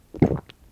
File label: swallow